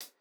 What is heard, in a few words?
hall resonance hihat